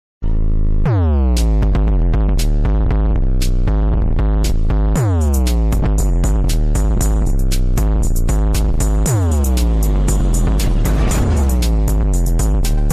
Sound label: bass basketball